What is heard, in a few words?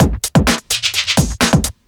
Housebeats, 128BPM; Vengeance Samples; VEH2 Loops